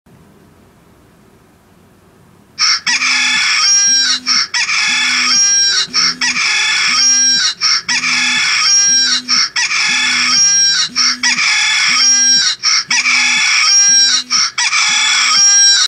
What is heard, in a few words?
Rooster Alarm; Android